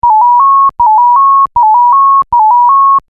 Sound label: ringtone